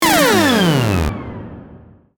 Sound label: Noise Stingers